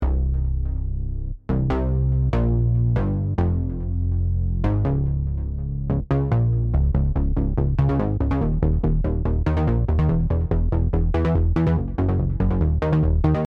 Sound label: Rhythm Emotion Bass